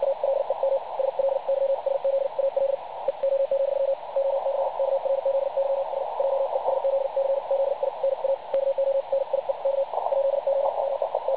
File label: websdr recording 3564.8kHz